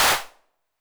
HAND CLAP, 909 Reverb Pitch Width

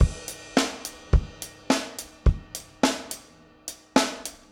Garage Funk Drums Crash, 70s Analog Funk; Sonic Foundry (Sony Creative Software); What it Is!

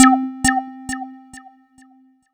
C2, SynthLine; 90 s Sample CDs; NiceBeats; SynthLine VOL-10

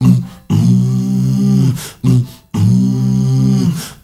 MALE HUM; Spectrasonics; Heart of Africa

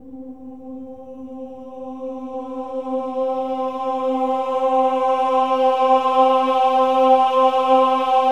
OH-AH C4, choir; Spectrasonics; Symphony of Voices

